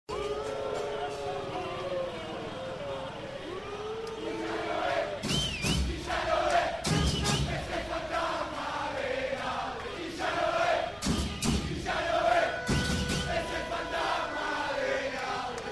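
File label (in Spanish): manifestaciones hinchas festejo descenso; av pellegrini; nob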